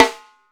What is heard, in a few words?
tight, snares; Sampleheads - New York City Drumworks VOL-1